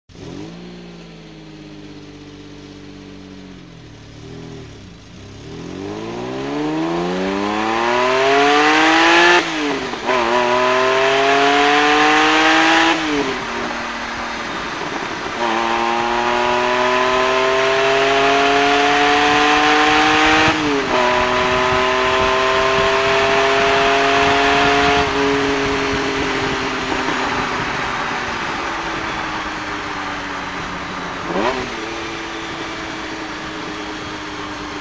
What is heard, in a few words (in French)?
Diablo a bord, lamborghini